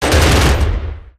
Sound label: pendulumturret firewindup player; gow